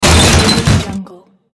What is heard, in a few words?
car crusher